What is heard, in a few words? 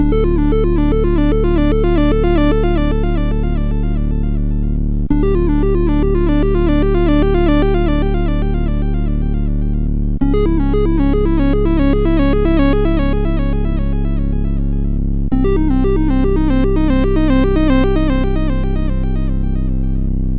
sid MOS6581R4